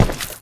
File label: muck walk